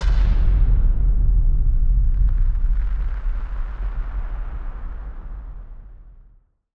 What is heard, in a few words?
Firework FX; musicradar; bomb factory